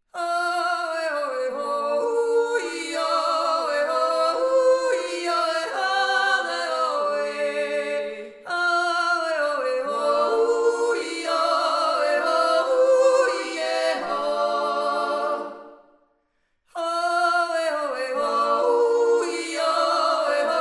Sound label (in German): mit hall 3stimmig